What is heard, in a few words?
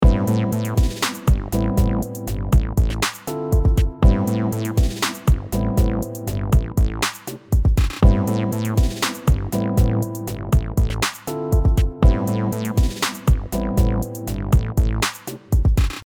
loop dark